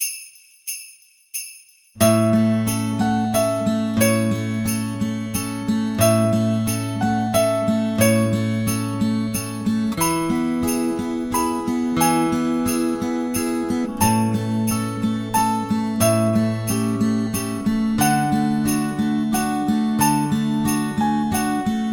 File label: silent night G arp, guitar